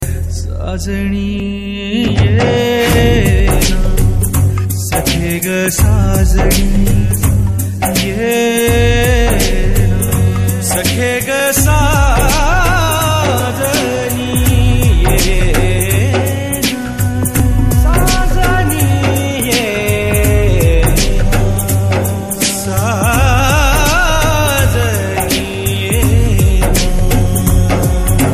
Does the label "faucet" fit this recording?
No